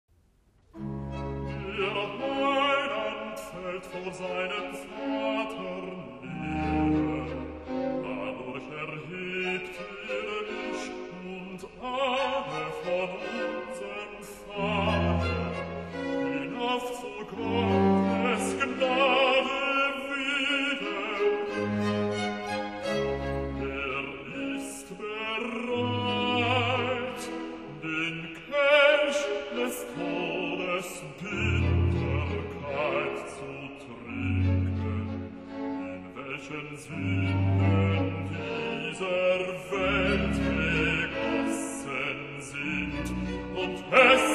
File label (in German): recit der heilandfallt voor seinem vater, bas; bach